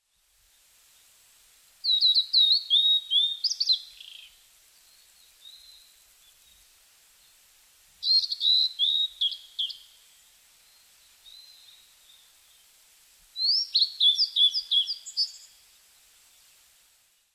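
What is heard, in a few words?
blue-and-white flycatcher; ooruri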